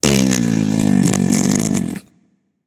tongue; smite; odin

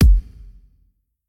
Defeat Kick; smite; raijin

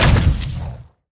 death disappear; smite; kuzenbo

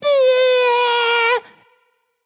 tongue, voicepack; smite; jorm

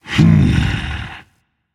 thinking rage; chaac

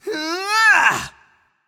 grunt attack; smite; voicepack; janus

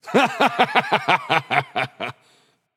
laugh; smite; heimdallr

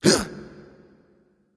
grunt jump; smite; ram

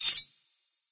Rub Hands; smite; god bakasura

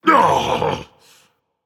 Ouch, Dragon; fafnir

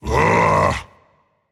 ugh rage; chaac

